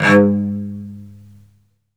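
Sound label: SOLO cello, Strings; ILIO; Synclavier Strings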